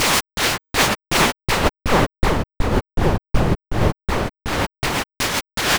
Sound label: pulsar seq; Big Beat Samples & More; AKAI; ESO SEQS KIT